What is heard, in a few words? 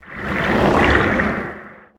flamewhoosh slow; Smite; Sol